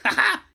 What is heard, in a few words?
rage